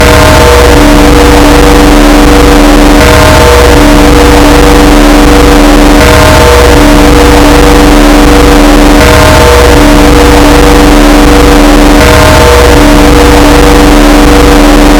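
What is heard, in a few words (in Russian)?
колокола на фоне помех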